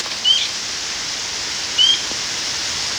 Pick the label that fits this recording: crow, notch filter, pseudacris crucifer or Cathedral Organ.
pseudacris crucifer